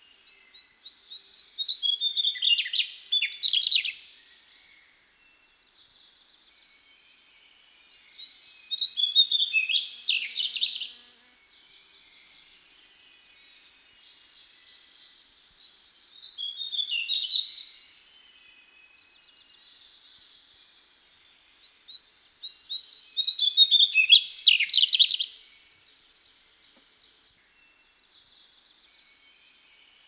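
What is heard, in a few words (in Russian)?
taezhnaya muholovka voice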